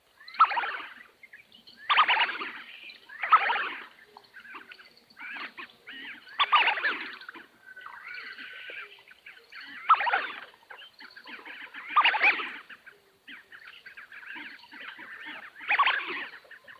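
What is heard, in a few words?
tufted duck song, birds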